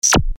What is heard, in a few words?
syntetic zap